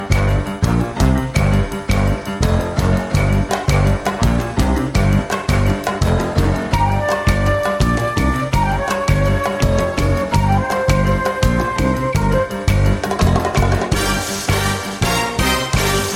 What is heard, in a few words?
Instrumental